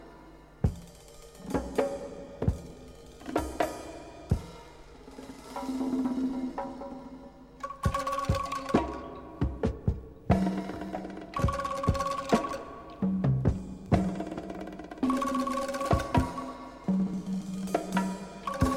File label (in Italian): Sole Percussioni